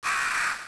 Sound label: crow caw